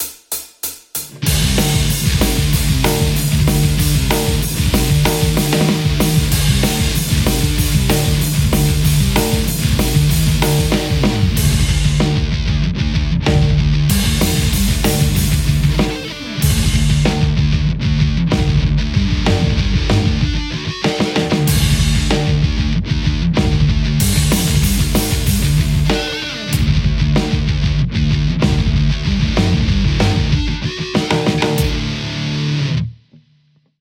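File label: metal Stratocaster; ronaldpassion; rechrome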